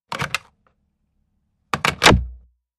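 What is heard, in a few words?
Telephone Pick Up Hang Up Heavier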